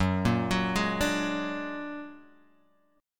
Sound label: Bb Augmented 7th Gb